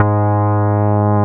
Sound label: BAS MINI, MOOG; Sound & Vision - Gigapack II CD 1